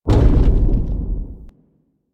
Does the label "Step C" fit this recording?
Yes